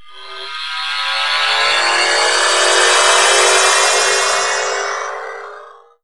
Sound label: Warp; 3DShooting